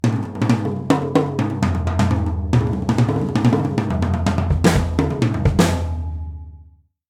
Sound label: Fireworks sting, soundtrack; minecraft story mode gamerip 2016; Order of the Stone